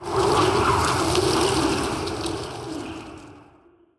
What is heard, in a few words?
Vermin Sensei Play